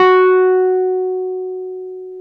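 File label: piano, Wurly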